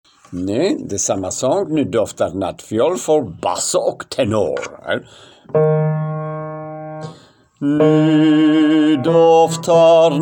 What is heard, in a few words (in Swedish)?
tenor o bas